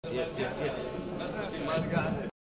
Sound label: loop